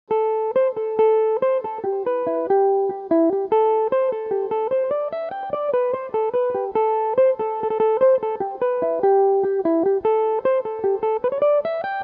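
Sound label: A dorian, Irish mandolin; castle kelly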